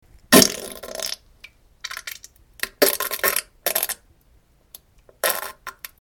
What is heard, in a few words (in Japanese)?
げりおなら ぶりゅりゅ音